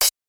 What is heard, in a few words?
cym